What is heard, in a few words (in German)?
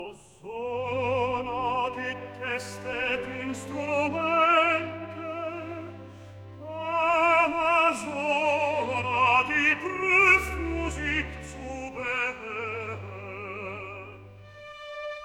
Oper